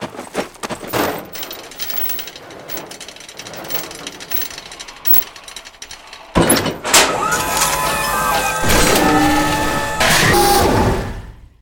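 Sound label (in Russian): высыпающиеся металлические мелкие предметы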